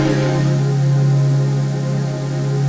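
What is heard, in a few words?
trance pad; ATMO PADS; Best Service; XX Large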